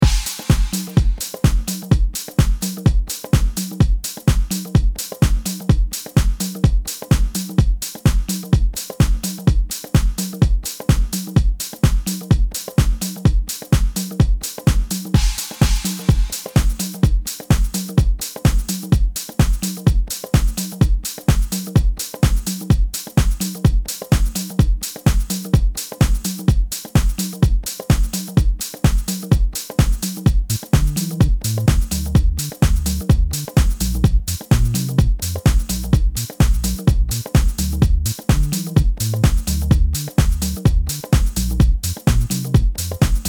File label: Instrumental